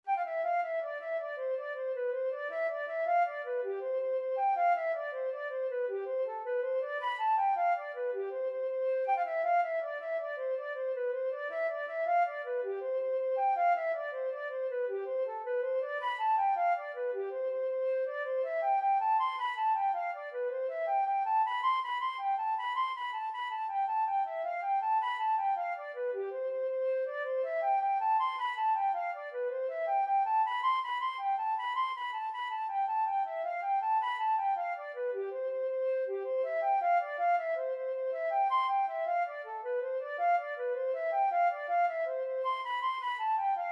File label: jig flute